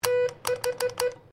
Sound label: MORSE CODE 6; Morse code aplphabete; Morse Code (Sound Effects:Electronics)